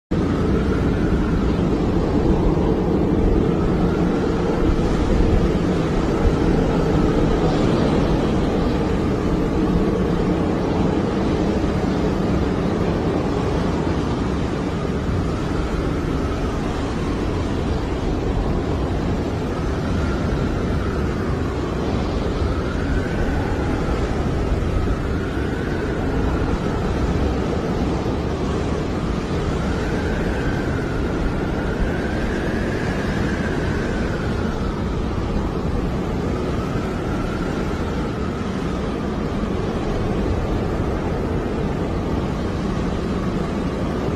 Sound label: blizzard